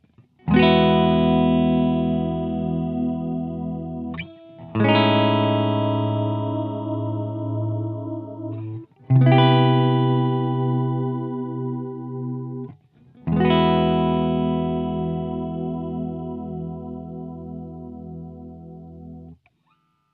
chorus Ramp

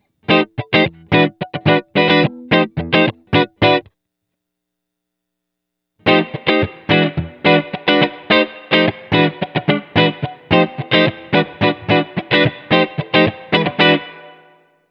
plate; BigSky; reverb; Basement Plate

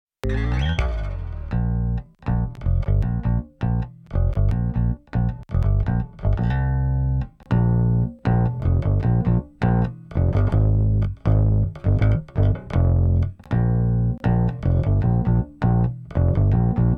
On Bass Distorted

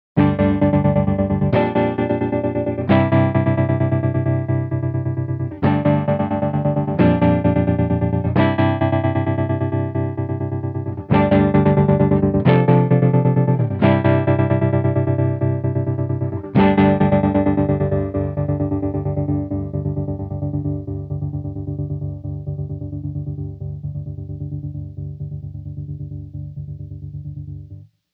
pattern trem, mobius

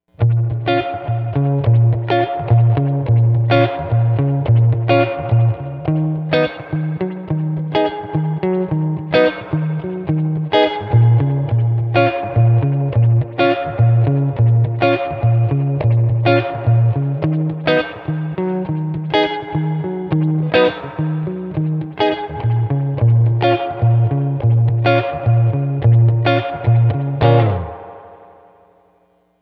spring; BigSky; reverb; Bright Repeats

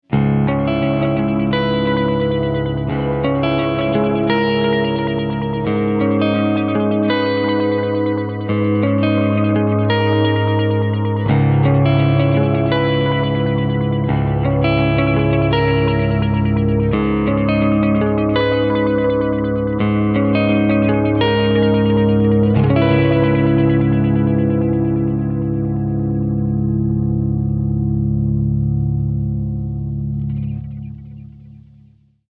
filter synthy triplets

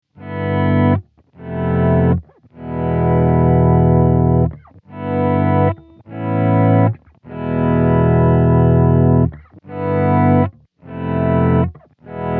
auto swell; mobius

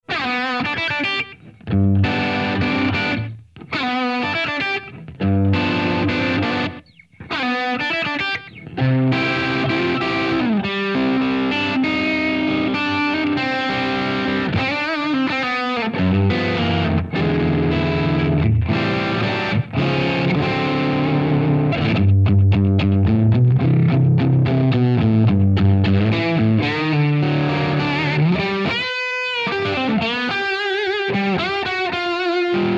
Fifties Vibe; Strymon Deco → Strymon Iridium; Tape

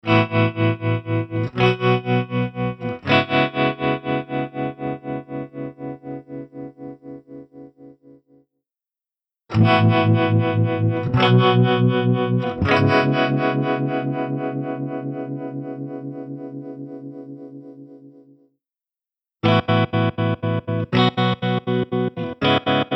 vintage trem; mobius

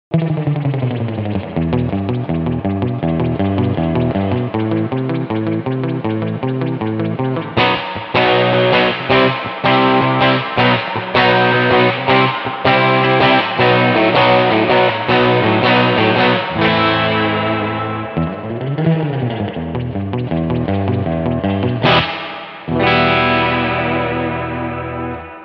spring; BigSky; reverb; Big Wave

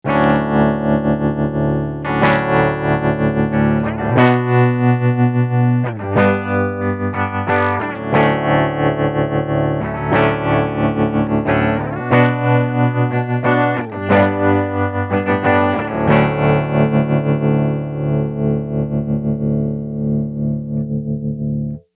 pattern trem; mobius

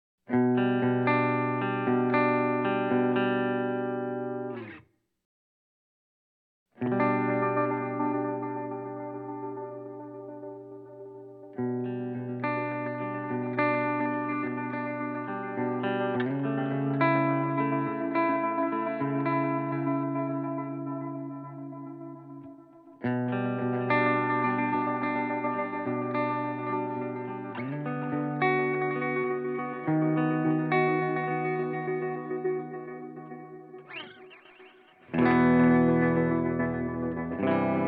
Plucky Trem; TimeLine MX

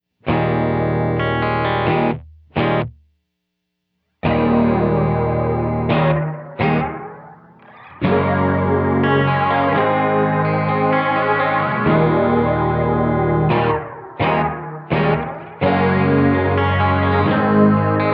dual flange delay

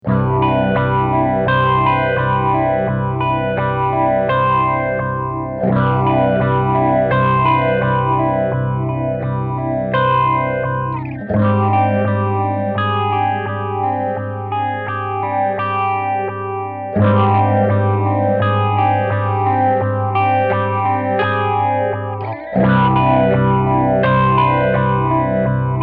phaser; Mobius

